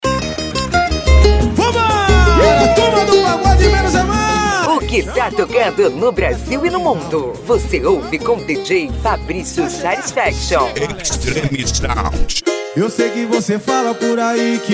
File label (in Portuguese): pagode e sertanejo; xtreme sound